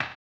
HAMBONE; Blues & Soul

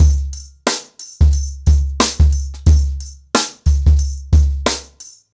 Nuclear 90BPM, ACOUSTIC DRUMS

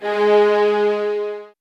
G, soft violins; Q Up Arts; Denny Jaeger Master Violin Library

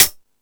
High Hat, Drum Machines

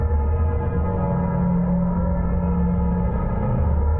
Tonal Re Draw, sparse soundscape

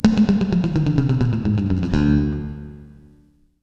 Bass, 130 BPM; Sampleheads; Ultra Freakin' Bass

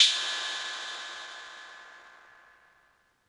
Cymbal Ring Long; Lost Tapes From Mars

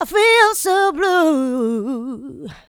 Female Vocals; Houseworx

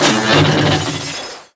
SOUND EFFECT, Metal; AKAI S6000 CD-ROM - Volume 1

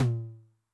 LT MOD Noise High, 909mod LOW TOM; classic machines

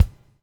BUBL; Spectrasonics - Backbeat

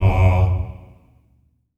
Staccato F F#2 L, Symphony of Voices; Spectrasonics

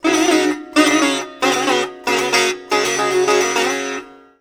SITAR GRV, World Colours; Best Service

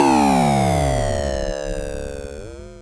Klone Dual Percussion Synthesiser FX

